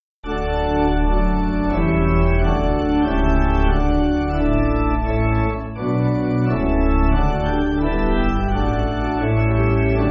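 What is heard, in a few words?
Pipe Organ, Backing Files for Churches; My Mind My Heart Praise; Boltze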